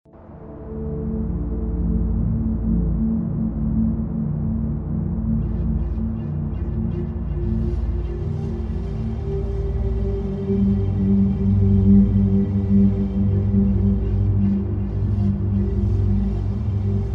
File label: Amb Sound Scape Ethnic flute, light wind, soothing ambience; Ambient SoundScapes